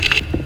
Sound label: slide lock move handle; We Were Here Forever